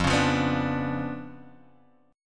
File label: Db 7th Flat 9th F